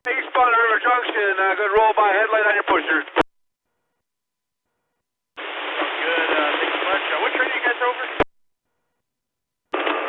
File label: Roll By; LTA River Junction